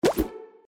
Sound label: ocean